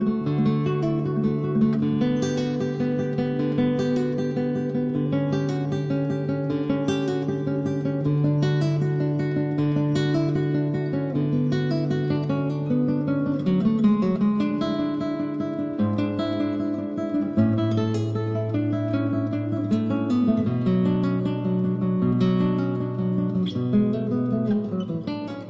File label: Cello Suite No. 1- Prelude